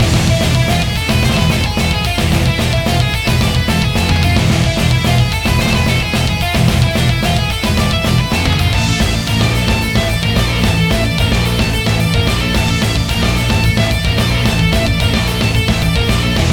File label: Desert Boss Loop